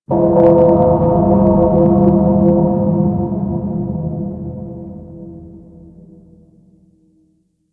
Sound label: Primordial Bell; Warframe; Ambience; Entrati